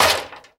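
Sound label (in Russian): тупой удар по деревянному шкафу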